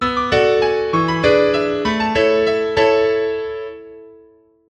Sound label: Nokia Tune